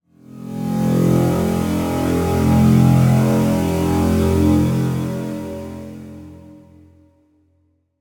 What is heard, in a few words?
Drone Short, Paranormal; Glitchedtones